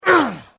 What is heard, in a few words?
Male Grunt Human Injury Pain Vocal Ugh Ouch